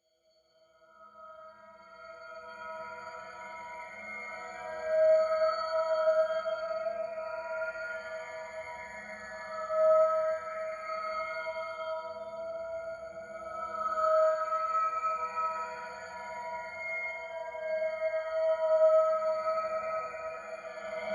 alien song, science fiction

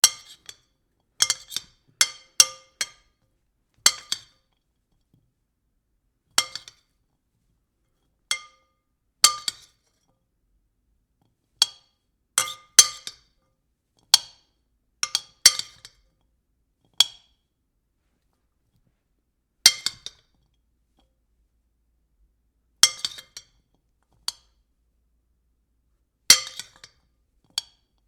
spoon on bowl

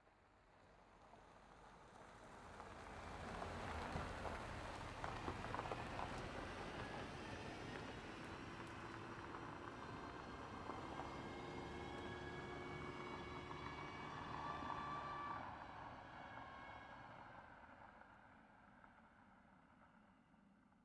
car on gravel

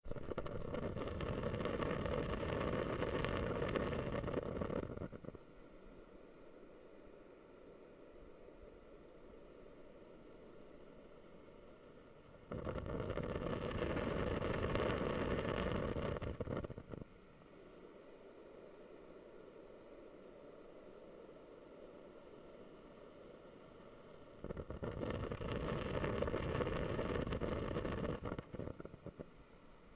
humidifier bubble

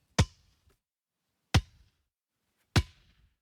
soccer ball bounce on pavement

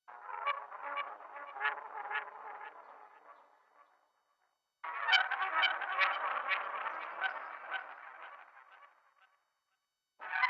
alien squawk echo

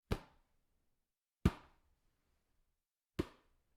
volleyball ball slow bounce

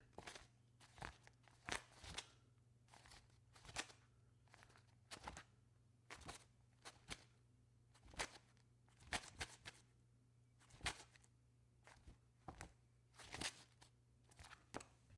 handle manilla folder

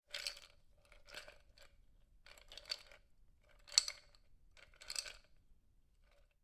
ice cubes in glass

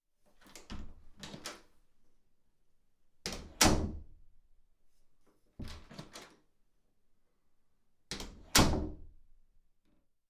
opening closing bathroom door

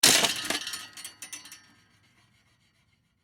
metal parts jumbling

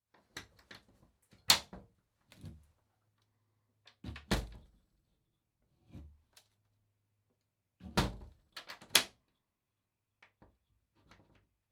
window latch open series

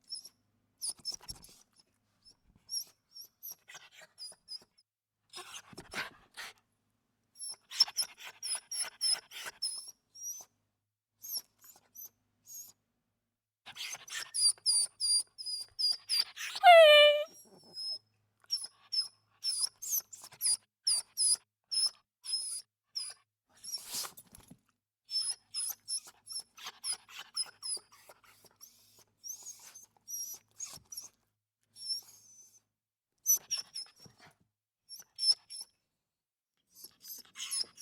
small dog wimper series

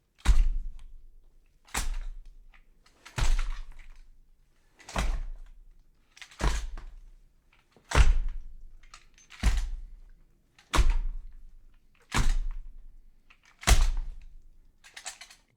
skil saw hit on body

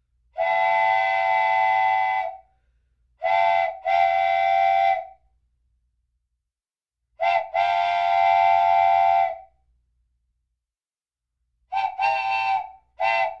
wooden train whistle

